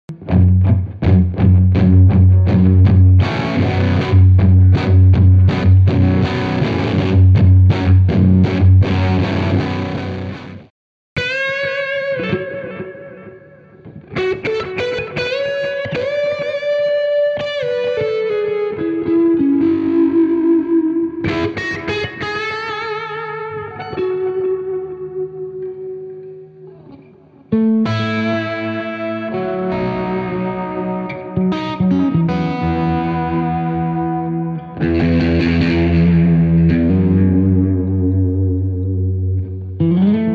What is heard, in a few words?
FUZZ FELLA BLUE BC108C Strat Style Guitar; CHASE TONE; SAM VILO